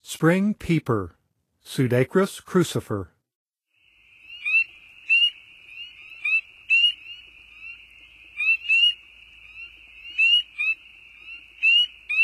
Spring Peeper; North America; Songs of Frogs & Toads of North America

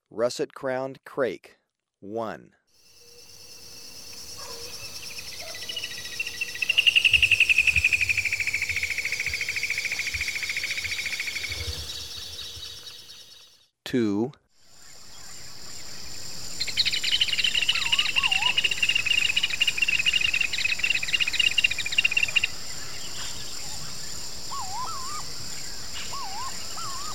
Russet-crowned Crake; S. America

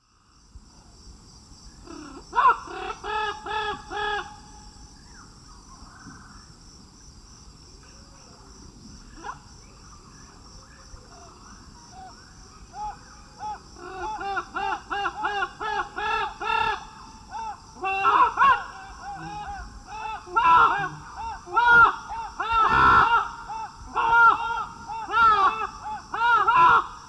Amazona festiva, calls 2 pairs; S. America; Eastern Lowlands

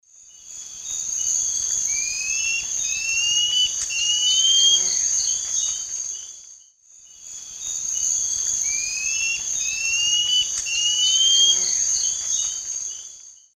Hylophylax poecilinotus, song; S. America; Eastern Lowlands

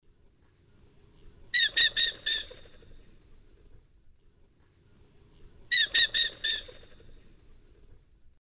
Aratinga weddellii, take-off calls; S. America; Eastern Lowlands

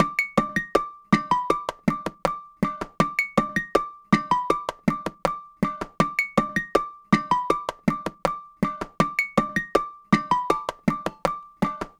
JUNKYARD RHYTHMS

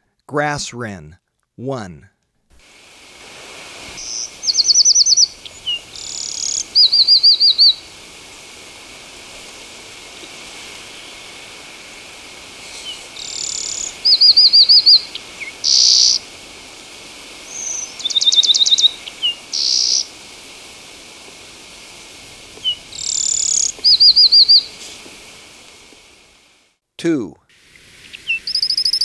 Grass Wren; S. America

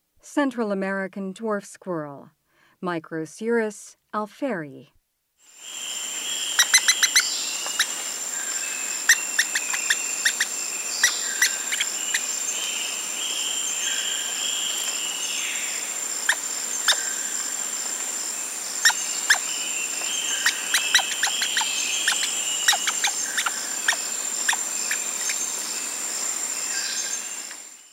Central American Dwarf Squirrel

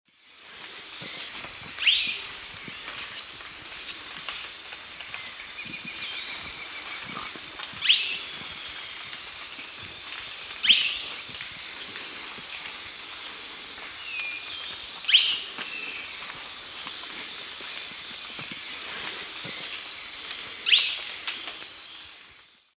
Conopophaga peruviana, call or song; S. America; Eastern Lowlands

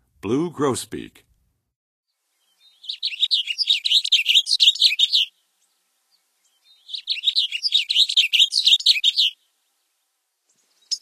Blue Grosbeak, Songs of Birds of North America; Western USA; Western USA Songbirds

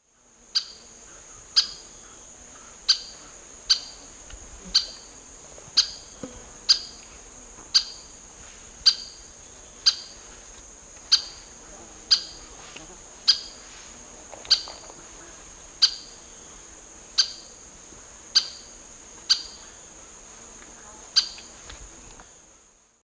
Myiodynastes maculatus, calls; S. America; Eastern Lowlands

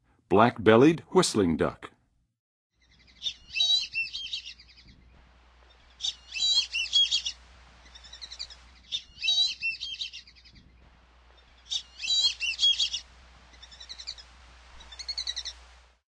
Black-bellied Whistling-Duck; North America; Western USA; Western USA Songbirds

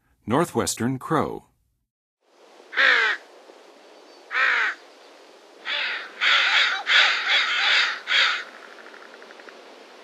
Northwestern Crow, Songs of Birds; North America; Western USA; Western USA Songbirds

